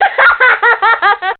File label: laugh girl; ooh ahh